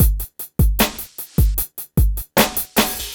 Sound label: PHATT BEA